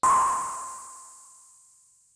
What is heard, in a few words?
SYNTH Tone; Mercury